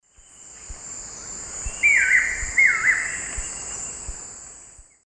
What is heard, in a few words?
Xiphorhynchus guttatus, call; S. America; Eastern Lowlands